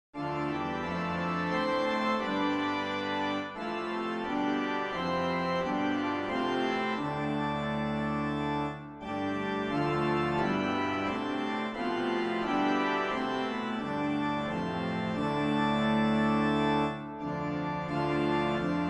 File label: Organ